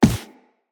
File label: Run Default, Footsteps; Characters; Operator